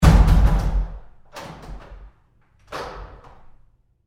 Door Bathroom Stall Close Roomy Med w Lock; Big Room Sound

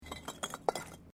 Bricks Movement Minor; Material components for constructing more complex sounds

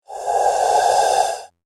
Ghost In The House; Ghost Sound Effects; horror sound effect; Andrew Fleming

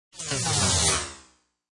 Scifi Phased Descent; Suitable as science fiction film and game production; Scifi Production Element - Phased Descent

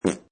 Cartoon Fart Small, Short fart, almost cute.; Comedy/Cartoon; Cartoon Sound Design; Lynne Music

